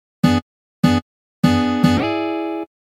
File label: Blues Guitar Turnaround